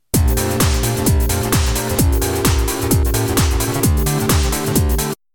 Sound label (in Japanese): 太いサウンドと効果的なリバーブ; ニコニコ動画